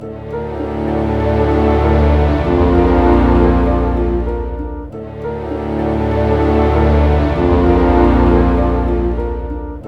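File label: orchestra doom; East West; Scoring Tools; Doom's Day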